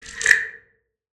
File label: water swell; soundscrate